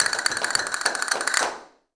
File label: CASTANETS, Flamenco